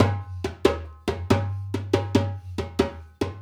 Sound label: Dumbek; Essential Percussion